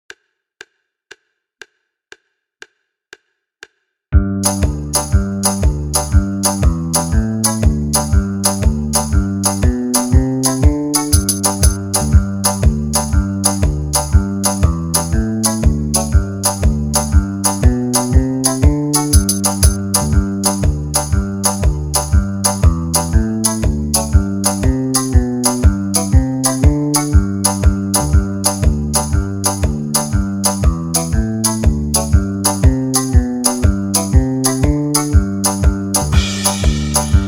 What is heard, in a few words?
key of g normal, backing track; banjo